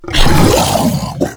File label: Flying Mouth Attack Fire; Enemies; Warframe; ManInTheWall